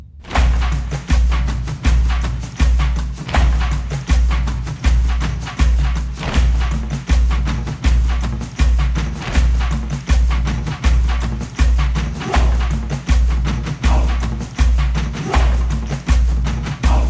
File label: techno Africa